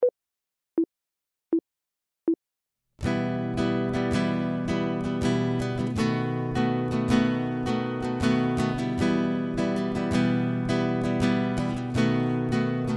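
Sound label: chord, guitar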